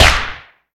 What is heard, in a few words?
roll; HeavenStudioPlus; kitties